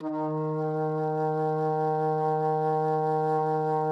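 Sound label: D#3, bass flute; Xsample VOL-2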